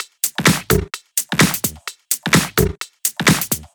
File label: Drumloops 128BPM